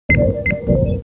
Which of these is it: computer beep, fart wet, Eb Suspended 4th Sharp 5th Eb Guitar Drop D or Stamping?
computer beep